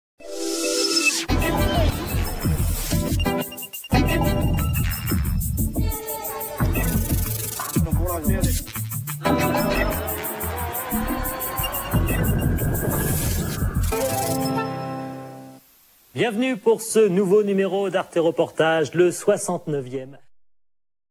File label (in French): generique reportage; arte